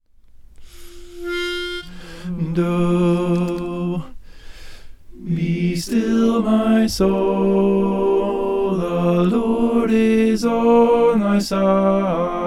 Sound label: bass